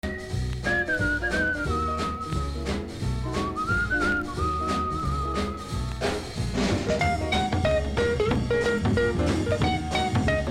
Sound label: rock